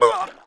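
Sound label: zombie ambient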